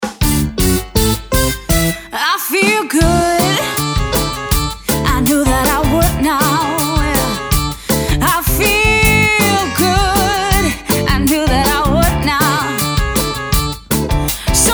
SOUL BEAT

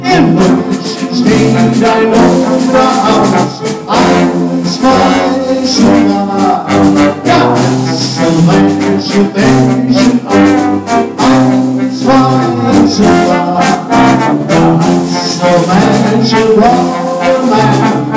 Oompah Band; The Oompah Band

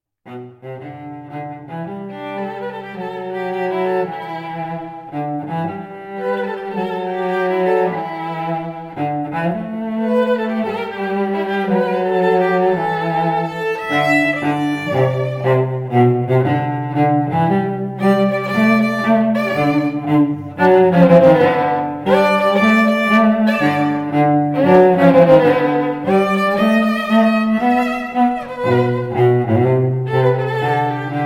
String Duo

